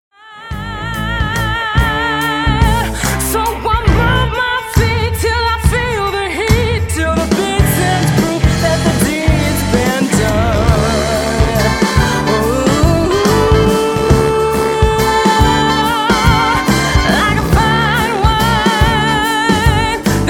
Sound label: Horn Drums Percussion Organ